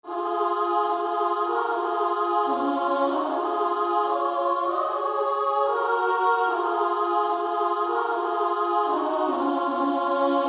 Female Choir SA; Stepping in the Light

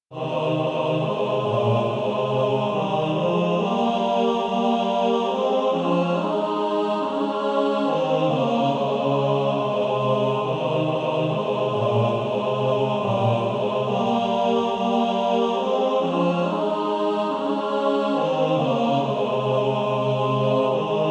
Male Choir TB; Infant Holy

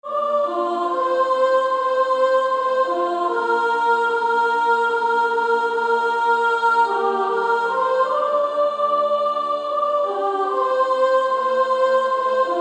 Female Choir, Practice Music; SSAA; 1st Soprano; Jesus Calls Us

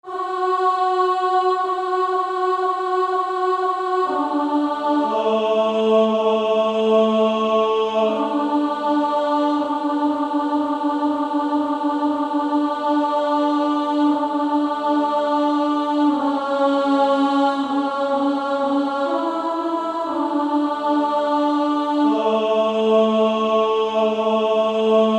Female Choir

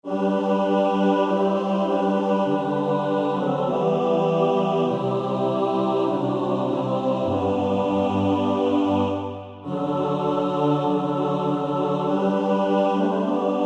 mixed

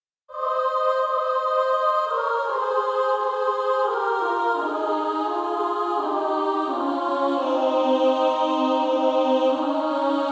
Female Choir

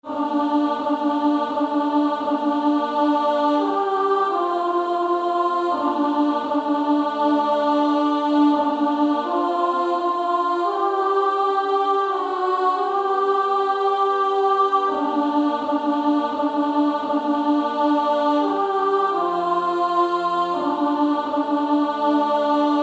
Female Choir; 2nd Soprano; Coventry Carol

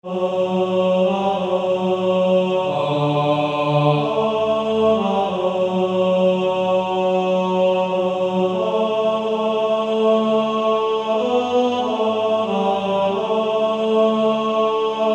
Male Choir

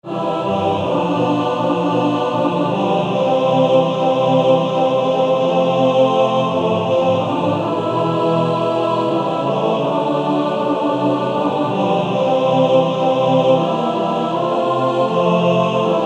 Male Choir TTBB; Jesus Calls Us

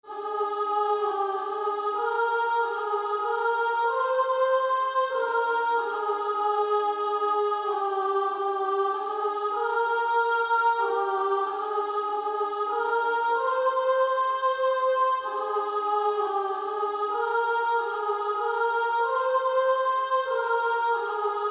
Female Choir

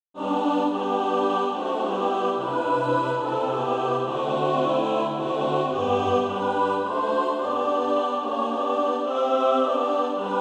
mixed; A Song and a Carol for Christmastide

